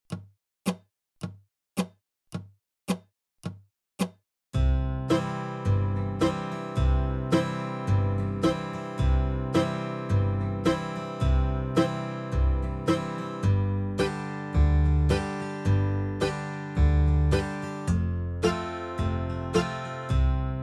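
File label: Key of C 54 BPM, Dobro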